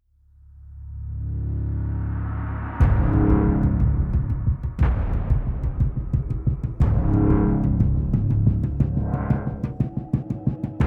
Suspense Oneshot

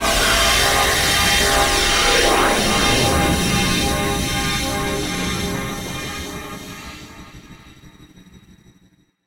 Space Warp; Wario Land: Shake It!

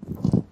mat Walk

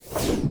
WHOOSH Air Very Slow

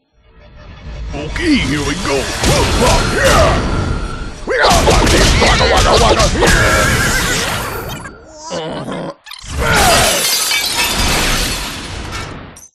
smash; comic jumper the adventures of captain smiley; xbla; x360

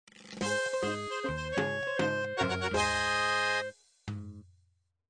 Fanfare; Papa Louie 2: When Burgers Attack

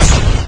attack

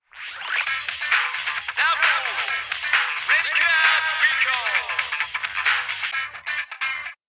Radio Jingle

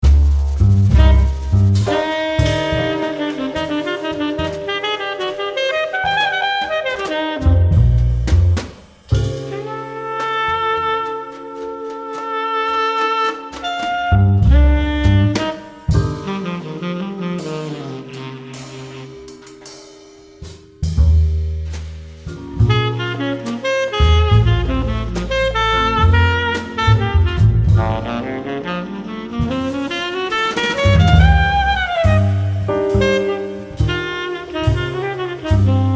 Noire Ambience; l.a. noire the lost tracks

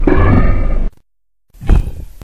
death, Voices; Gromila

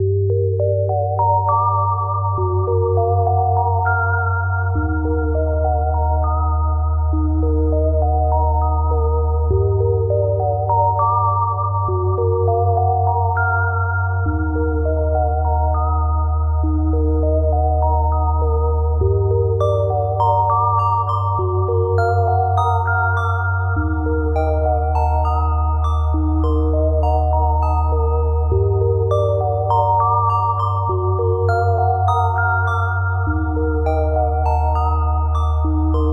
Ominous Loop; minecraft pacman 40th anniversary

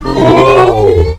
death upgrade; Voices Golovan

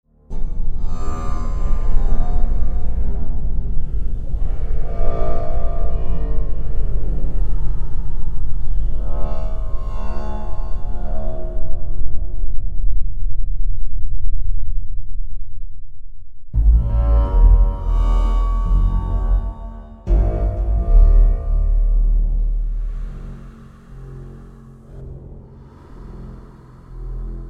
Menu Ambience; SCP Containment Breach

